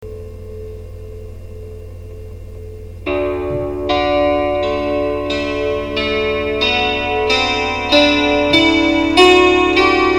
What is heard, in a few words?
scale